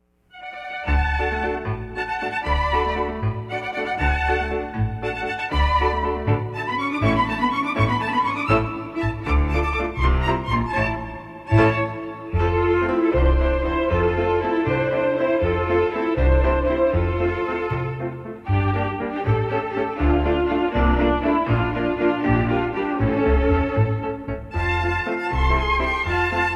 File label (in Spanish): violines; consort